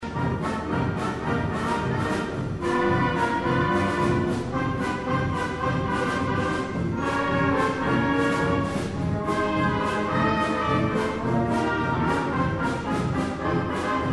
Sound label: Dixie, band